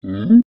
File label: grunt; CC0 creature sfx 2